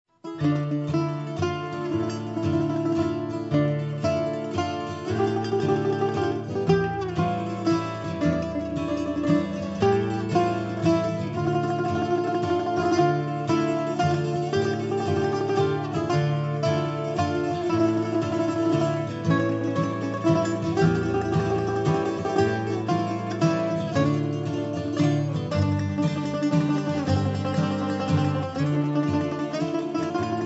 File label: Bouzouki